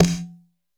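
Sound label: METAL CONGA SL; AKAI S-Series CD-ROM Sound Library VOL-5; FX PERC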